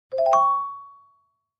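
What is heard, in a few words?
Clavichord Short Arpeggio; keyboards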